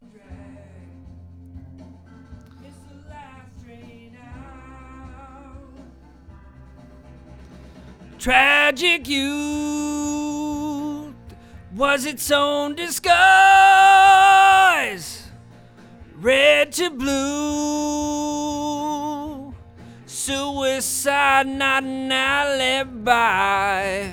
VOX Double

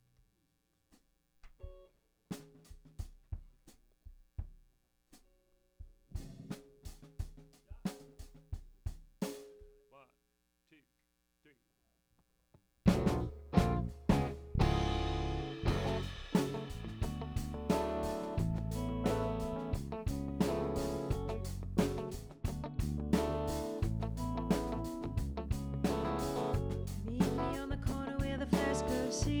Rough Mix L

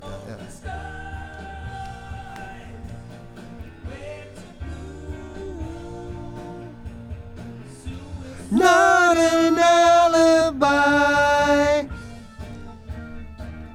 VOX Back

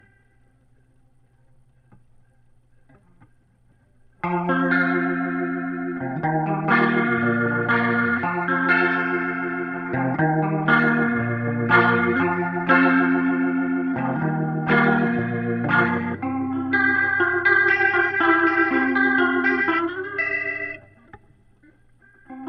Leslie gtr

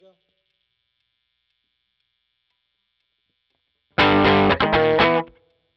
Gtr

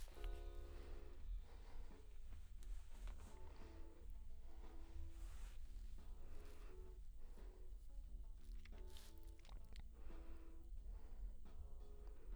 LdVOX Double

